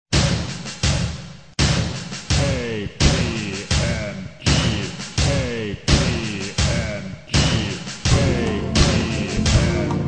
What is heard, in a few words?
teutonic; KPMG